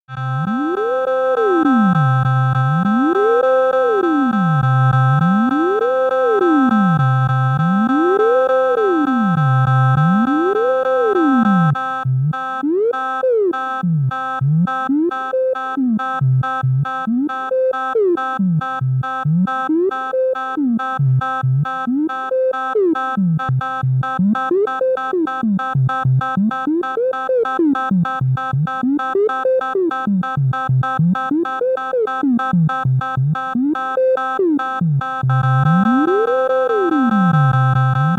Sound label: glissando illusion pan; with each channel played alone for a short time; This is a stereo sound file; Deutsch's Glissando Illusion